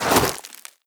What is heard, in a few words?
scuff, footsteps player debris